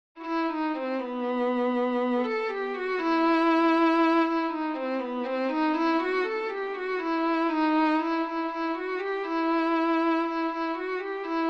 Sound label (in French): violon